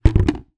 household bucket plastic down on hard floor; zapsplat

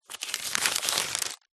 household magazine cheap page turn

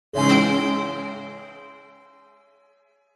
multimedia game sound negative magical

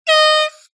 sport air horn 1x blast; zapsplat

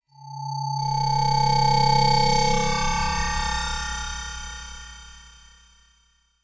fm spooky metal shrill

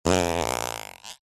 human fart dry

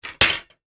household moses basket stand put up; sound effects one

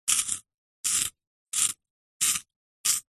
office pencil sharpener sharpen charcoal pencil

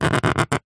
foley small spike scrape scratch thin plastic; zapsplat

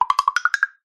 cartoon wood knocks taps crazy; zapsplat

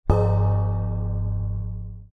musical cymbal crash tap light hand knuckle; zapsplat

